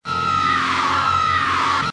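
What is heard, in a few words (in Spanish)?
grito, scream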